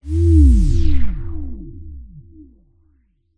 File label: sound design whale call filtered dark; zapsplat